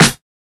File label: old school clicky acoustic snare sample c key